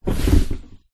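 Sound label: Sit Cushion Chair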